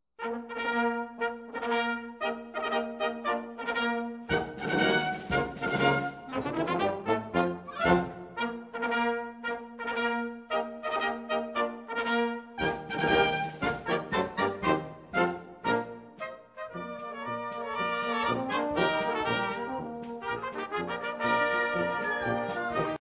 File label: POLKA